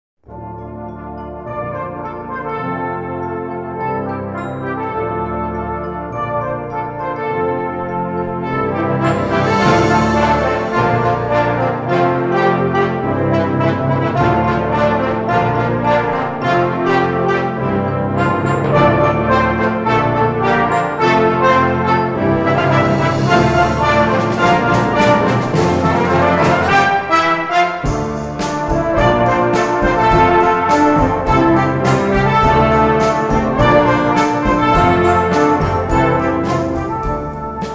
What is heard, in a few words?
Whistle